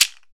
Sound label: PRC WOLOF, Afro Shakers